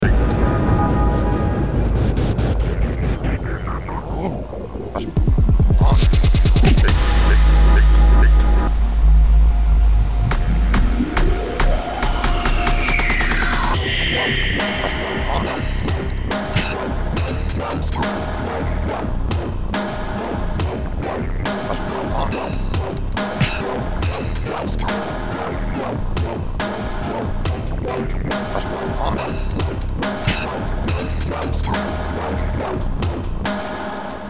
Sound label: dubstep